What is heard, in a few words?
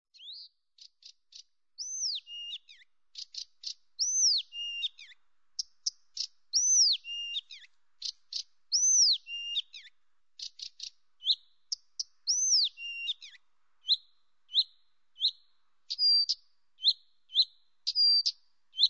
acrocephalus dumetorum